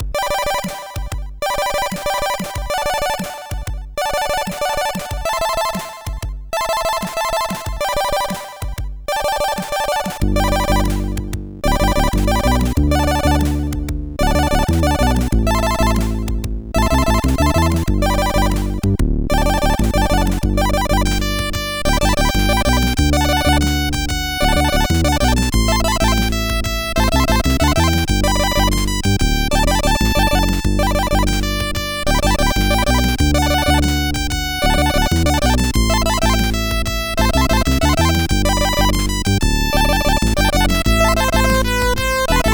Intro, CSG8580R5

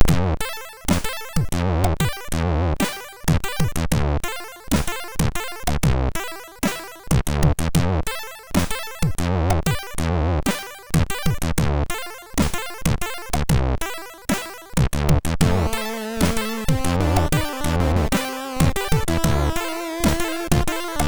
Explosion, MOS6581R3; Deek; hvsc

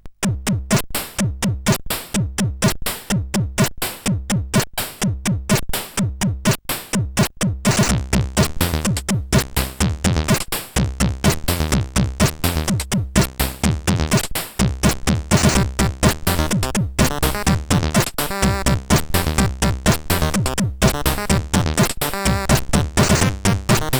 Vice, sid CSG8580R5

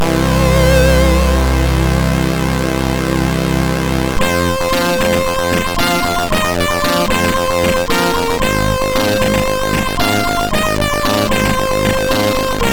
E-Load loader, MOS6581R4; hvsc

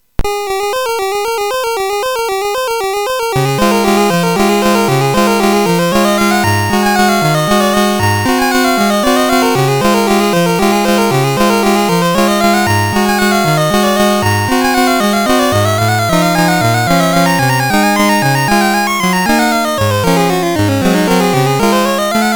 Minute Waltz, sid MOS6581R4; Master Composer

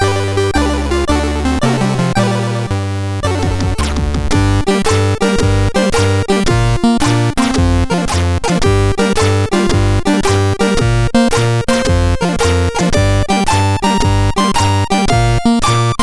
Tetris, sid MOS6581R2

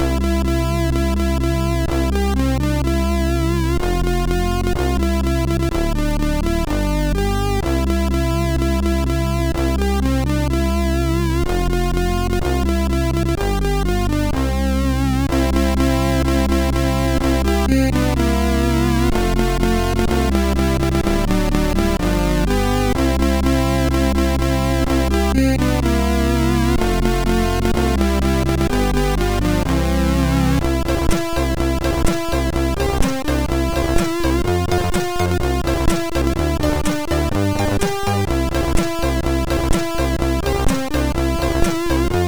Jingle Bells, MOS6581R4

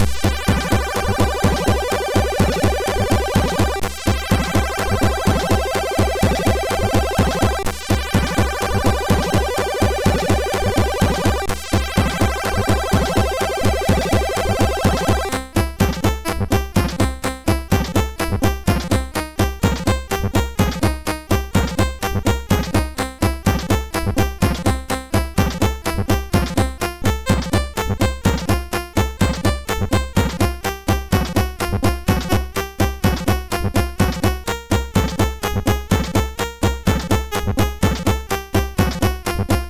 sid, MOS6581R2; Flexible tune 3

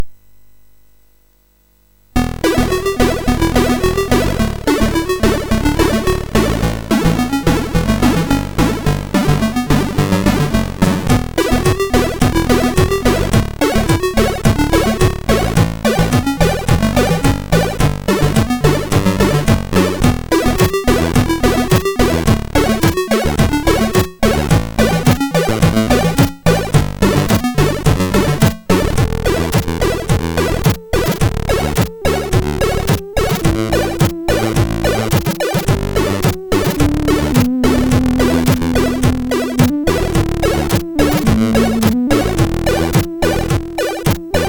sid, MOS6581R4

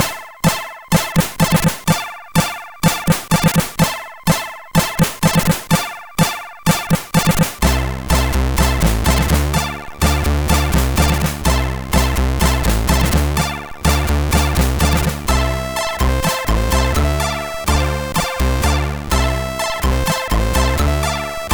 Magical Spell, MOS6581R4; HVSC; Magical Spell v2

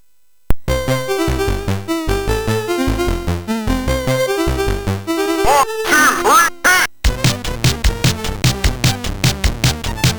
Fishbrain, sid MOS6581R3; Sanden Peter

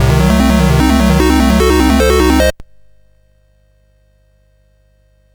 Dragonworld sid CSG8580R5

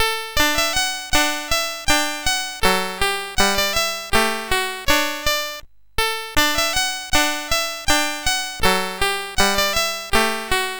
SX 64 Demo sid CSG8580R5; Commodore

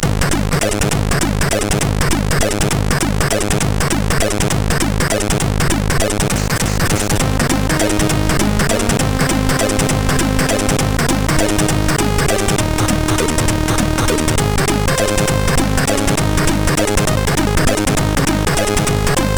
SPLASH, sid CSG8580R5; hvsc

